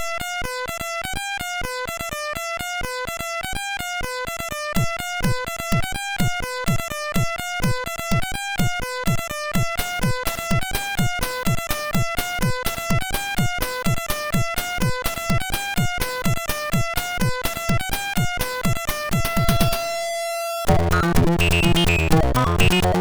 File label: Technonoise; MOS6581R3